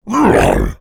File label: demon fight grunt; aaa game character; esmteam